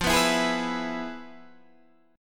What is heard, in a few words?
F Minor Major 7th Flat 5th Guitar Standard; FmM7b5 strummed; JGuitar